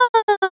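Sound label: voice haha female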